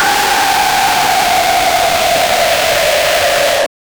distorted jumpscare

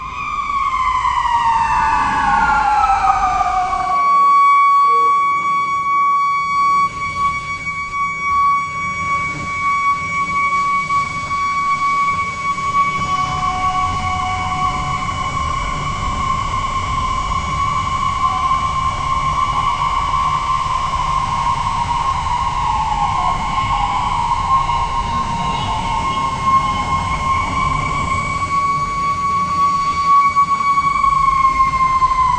continuous ambient noise, emergency

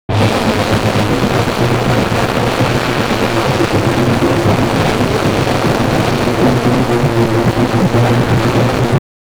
loud fusion reactor sound; nuclear

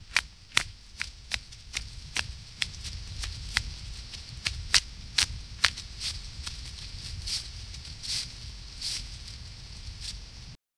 the sound of hands gently, agriculture

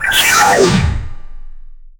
a transparent energy sphe, magic

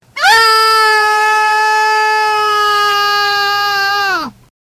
goat screaming loudly

